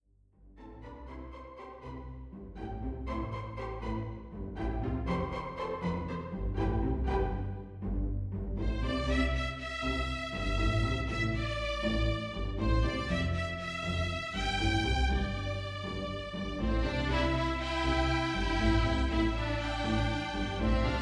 for String Orchestra

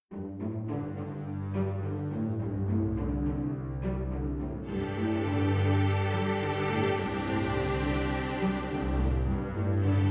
for Strings

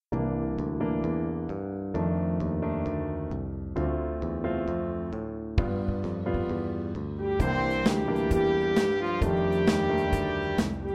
SIMPLY JAZZ

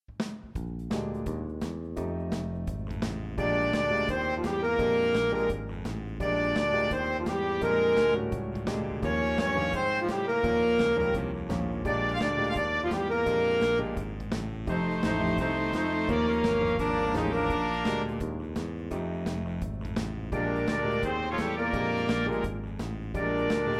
PIGEON BLUES for Jazz Band

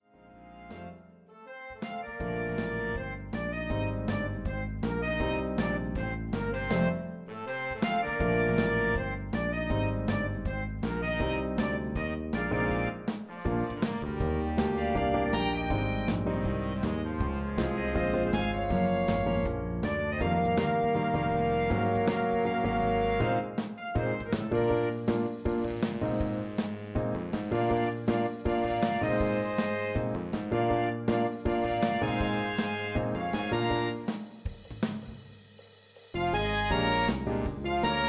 Jazz Band

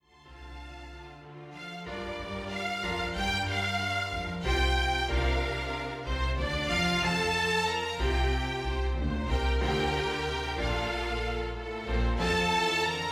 string orch piano accomp

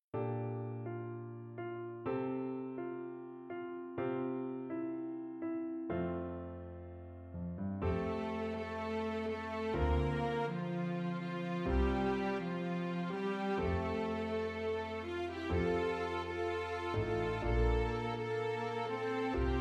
for String orch or Quintet piano accomp

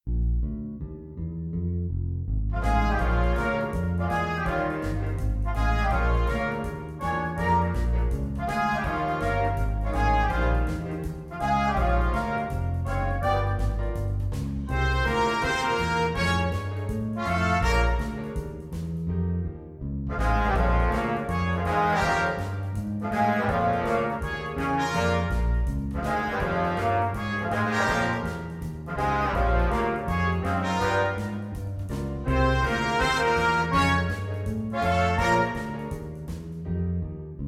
BLUES for Jazz Ensemble

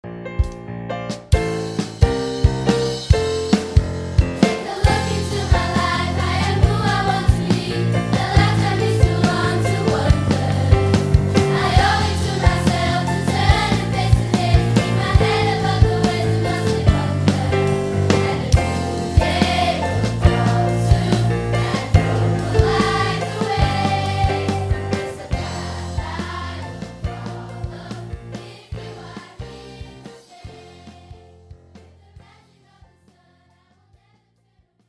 sample of students and band lower key

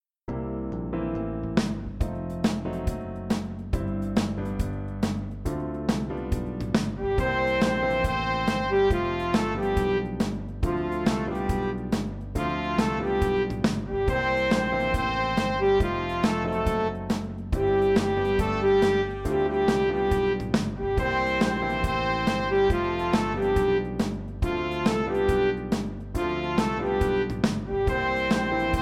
for Jazz or Mixed Band

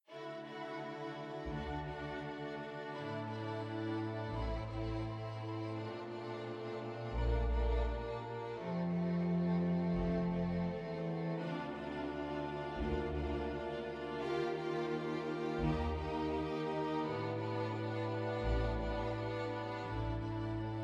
for String Orchestra

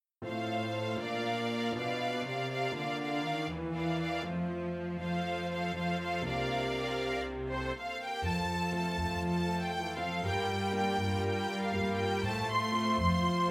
Strings Orchestra or Quintet Piano accomp

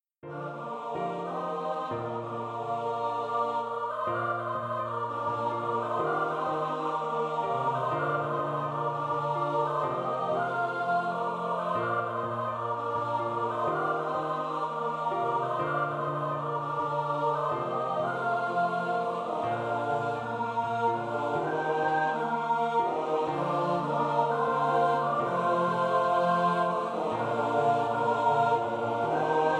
4 part song for SATB

